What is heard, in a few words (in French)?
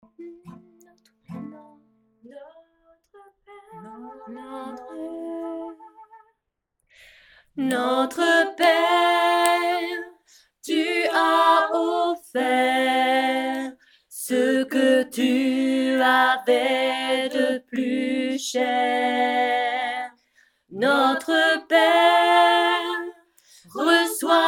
voix alto